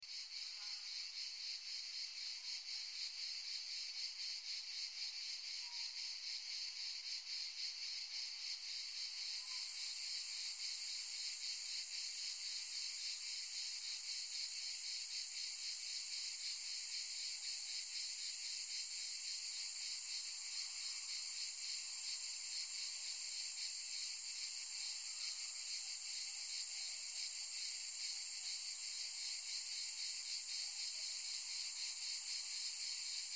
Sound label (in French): cigales